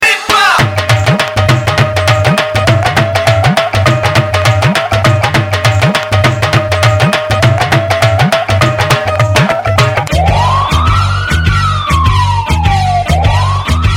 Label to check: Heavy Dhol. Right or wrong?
Right